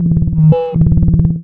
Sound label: dog phrase; npc; Half Life 2; Episode 1